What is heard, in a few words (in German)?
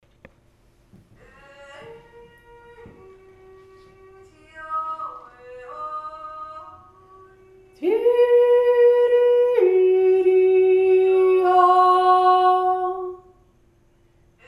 hohe stimme